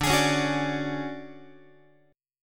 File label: D Major 7th Flat 5th D down Guitar 4A 4E 5A 5D 5G sharp 6C sharp; DM7b5 strummed